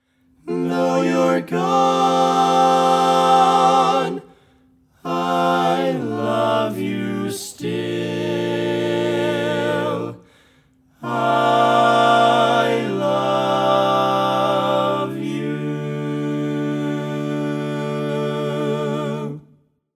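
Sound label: baritone, barbershop tags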